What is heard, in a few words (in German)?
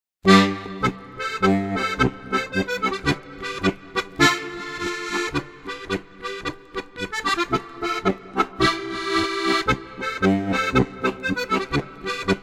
zillertaler hochzeits marsch